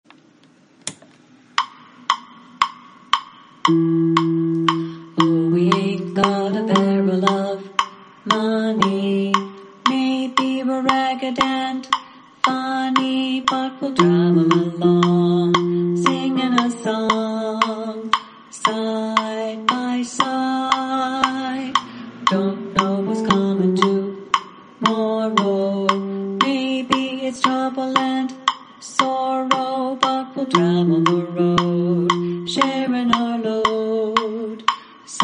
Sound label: tenor